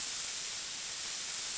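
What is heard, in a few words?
gas release loop; Star Wars Obi Wan; XBOX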